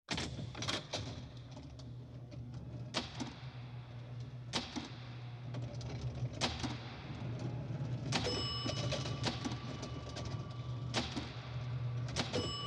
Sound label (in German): spielautomat; Gluecksspiel